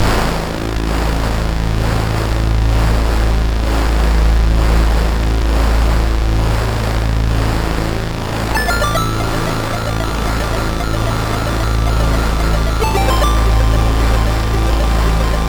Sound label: synth